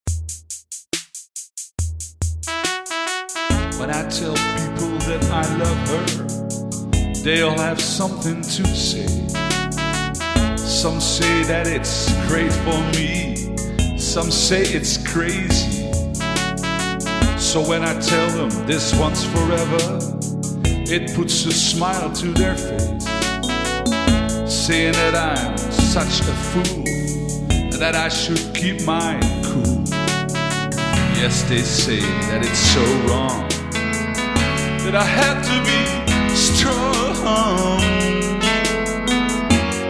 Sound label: Drums